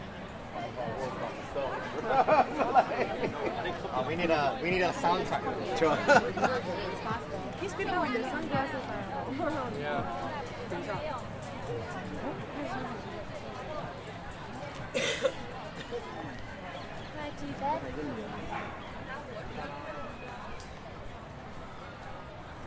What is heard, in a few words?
Partial Eclipse